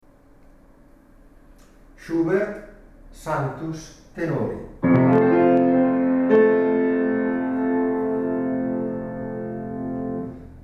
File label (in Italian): tenori; Sanctus Schubert